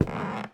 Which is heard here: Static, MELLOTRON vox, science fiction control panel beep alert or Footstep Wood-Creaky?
Footstep Wood-Creaky